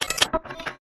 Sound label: Data Corruption, stinger